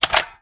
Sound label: mag out, hk416; tacz; daffas arsenal